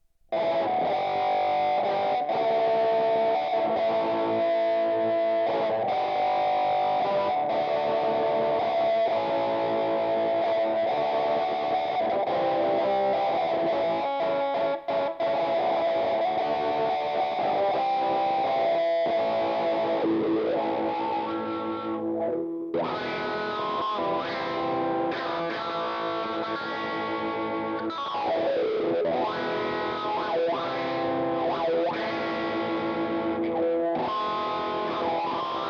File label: electric; MegaEpix